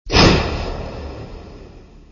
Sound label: texture bang